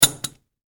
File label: Spanner: Dropped Onto Surface; Hand Tools; Hand Tool Sound Effects; Hand Tools (Sound Effects:Tools); Stuart Duffield